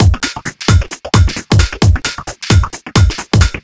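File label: 132 No Snare, Drum Loops; Zero-G; Soundclash Nu Dance Electro; Acidized Wavs; 132bpm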